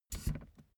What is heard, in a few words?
office chair hydraulics down stepwise